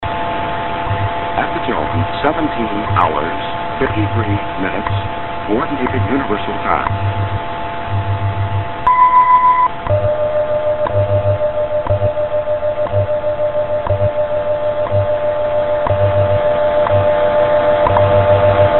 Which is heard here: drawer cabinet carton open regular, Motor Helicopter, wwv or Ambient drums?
wwv